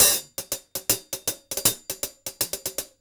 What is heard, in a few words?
080, Top Ends, Beats; Zero-G; Monster Beats